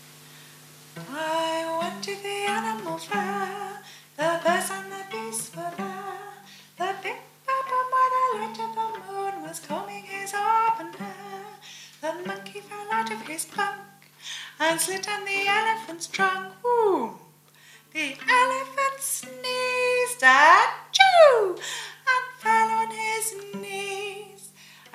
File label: Animal Fair Vocal